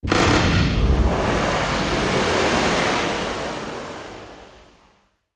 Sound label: Big Static Blast